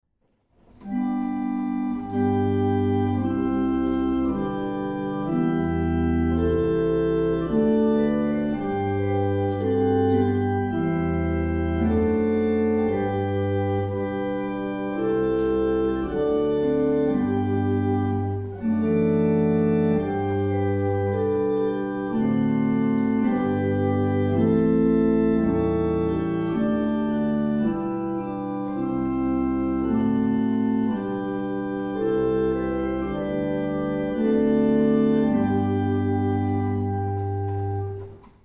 Evening Hymn, barrel organ; Lopham

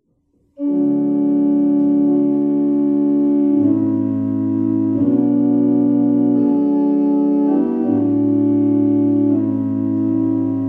barrel organs; Raithby

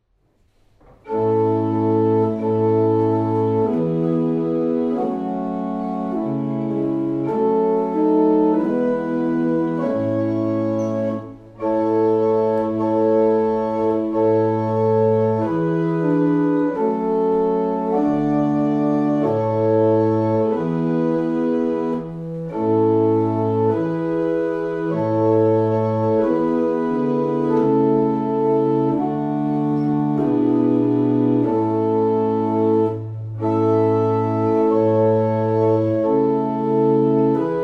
barrel organs; Steeple; Old 100th

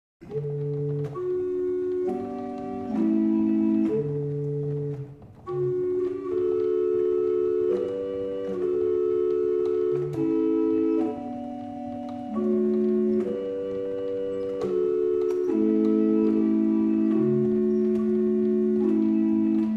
barrel organ; Liddington

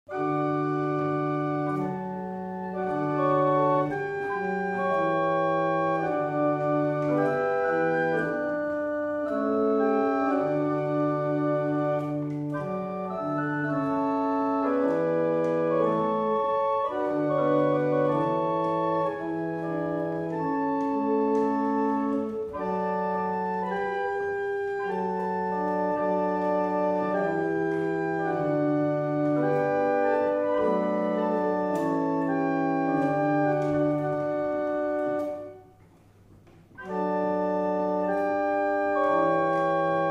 Oxford New, barrel organs; Winwick

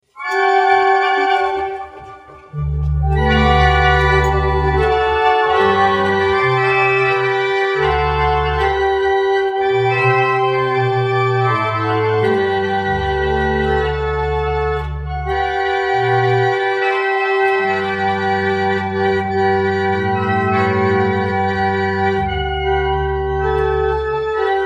barrel organs; Huttoft

